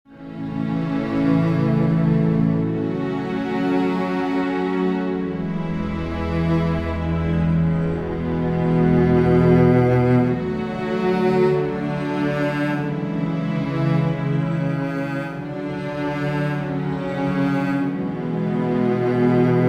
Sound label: Healing Strings (no drums)